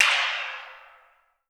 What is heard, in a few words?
SHEET METAL, Definitive Percussion